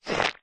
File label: cartoon squelch; zapsplat